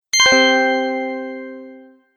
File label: Email Sent, beep sound effects